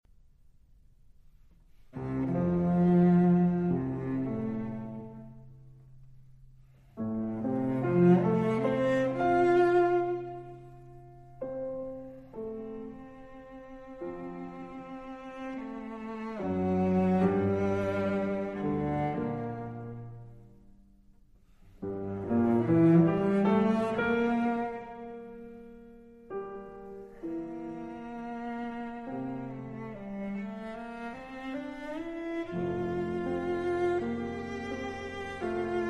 Cello Sonata 1 In F Op 5 1 Adagio Sostenuto Allegro; Beethoven; EMI Classics